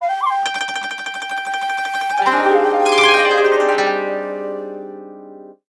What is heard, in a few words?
Jingle - Eastern